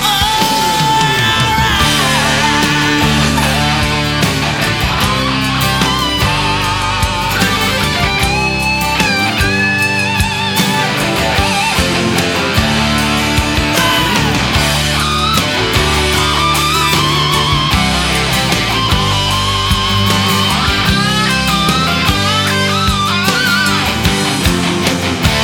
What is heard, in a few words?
solo